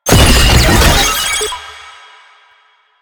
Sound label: Sniper Drone Deploy; Enemies; Drones; Warframe; Lasrian